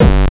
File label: distortomatic, Kicks Electro